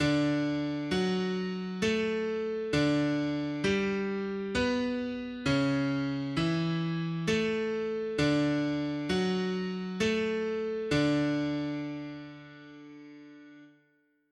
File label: sing midi d